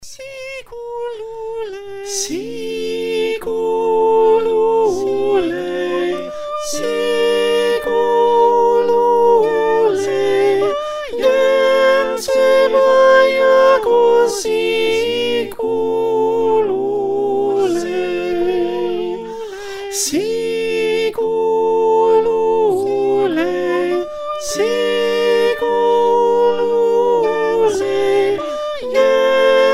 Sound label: Soprano